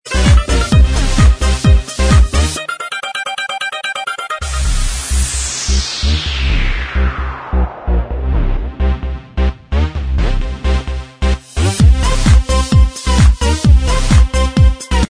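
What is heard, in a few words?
instrumental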